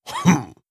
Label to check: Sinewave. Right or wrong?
Wrong